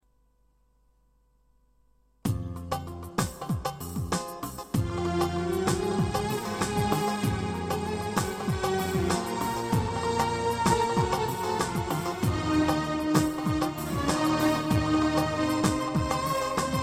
pa 55 tr yaylilar klarnet

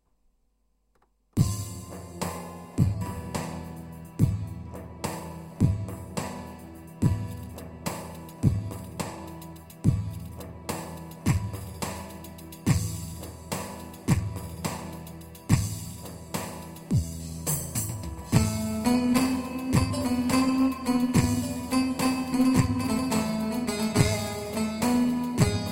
5 8 turku kuru saz, pa 500 tr

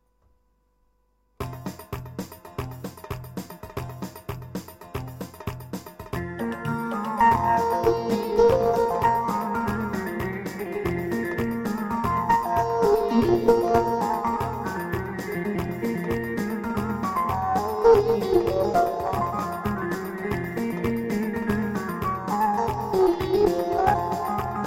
giresun karsilama, korg pa 55; ses ve ritim demolari

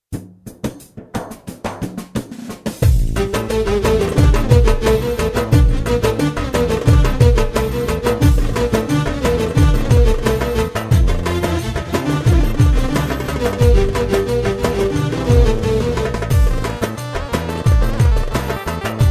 gem wk8 le ciftetelli ve tr sample sesler

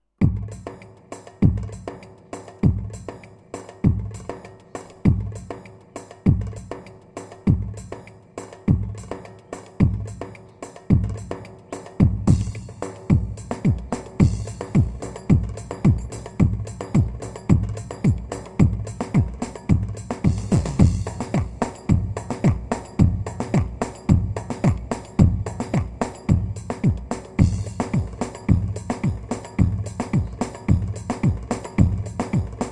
pa 500 tr kolbasti, 2 4 ritim

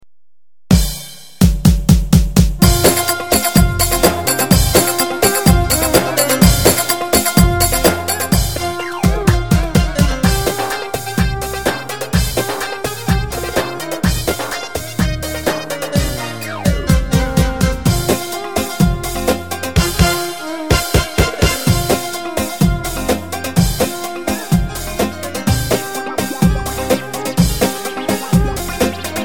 arap; a1000 demolari

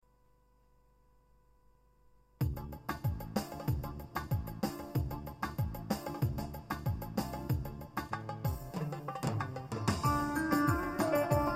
korg pa 55 tr elektro baglama; ses ve ritim demolari

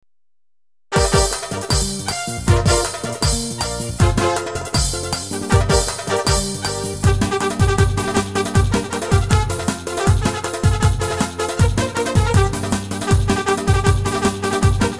mezdeke; dante tr

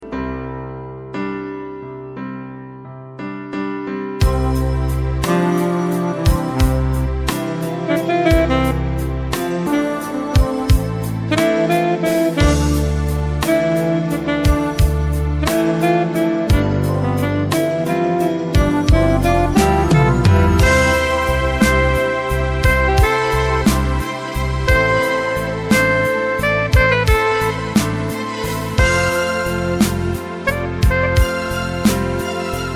akustik piano ve gitar; korg pa3

